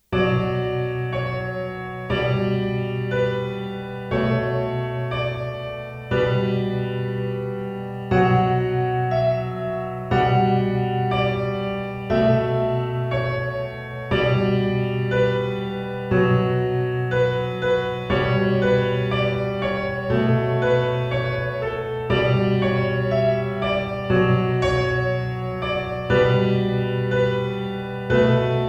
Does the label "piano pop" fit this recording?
Yes